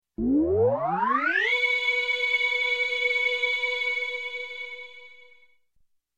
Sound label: Space Fade Out, Electronic; Sound Ideas 2000 Series